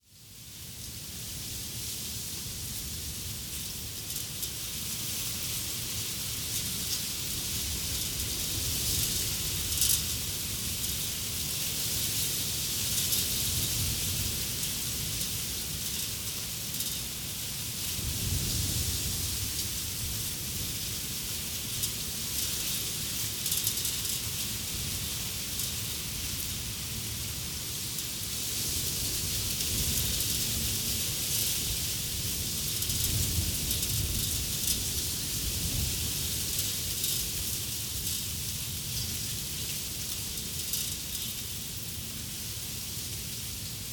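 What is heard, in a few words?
Ambience Battle Village; rabbids multiverse; iOS, macOS, Mobile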